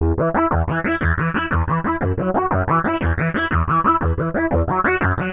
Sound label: Acid Effect